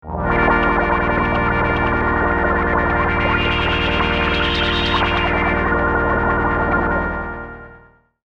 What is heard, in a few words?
Rainy Day, Prophet '08